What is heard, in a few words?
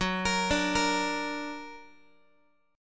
F sharp Augmented F sharp Guitar; x,14,13,12,14,x; arpeggiated